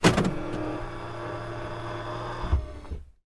transport car peugeot 307 electric side mirrors out internal